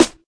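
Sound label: classic hh Snare; Hip Hop; STYLE KITS; Classic Hip Hop Mega Kit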